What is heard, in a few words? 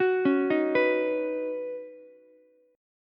Eb Minor 7th Sharp 5th, down, Ukulele; arpeggiated; chord; JGuitar